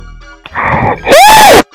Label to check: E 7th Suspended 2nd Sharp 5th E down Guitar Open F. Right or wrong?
Wrong